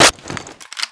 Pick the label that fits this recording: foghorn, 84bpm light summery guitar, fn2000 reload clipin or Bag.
fn2000 reload clipin